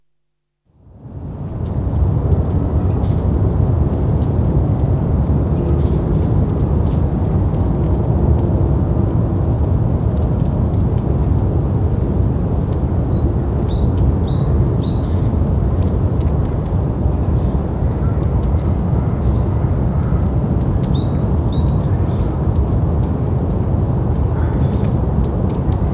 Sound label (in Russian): na pustoshi v selskoi mestnosti